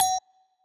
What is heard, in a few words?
marimba; ゆめ2っき